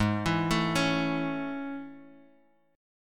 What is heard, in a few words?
A Major 7th Ab